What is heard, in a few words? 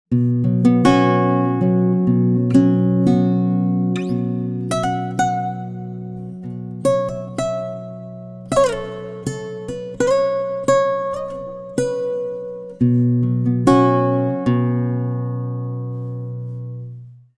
pitchshift guitar, iZotope Radius 2